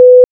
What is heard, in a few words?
T Morse Code Audio